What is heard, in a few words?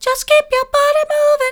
just keep your body moving soft, vocal